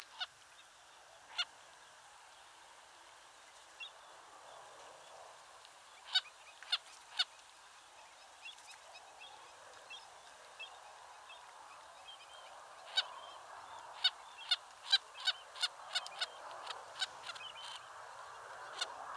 wilson's phalarope

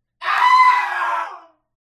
Screaming child

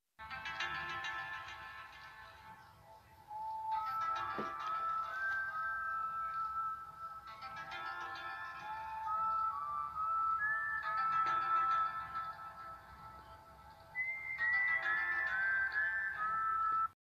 Space ringtone

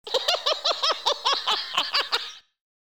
evil witch laugh

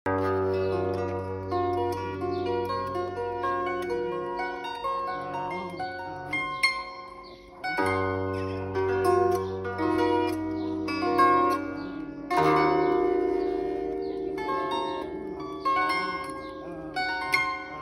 Kobza; کوبزا

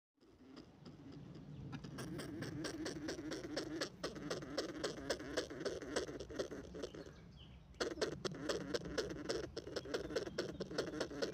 european edible dormouse; اشگول معمولی

